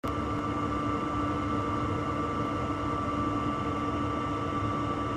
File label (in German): krach; dometic bedienteil in einstellung komfort zu laut; neuem dometic bedienteil